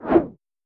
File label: Grapple Boost